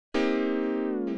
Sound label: HOUSE EXTRA, 125